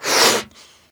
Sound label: inflate, balloon; World Of Goo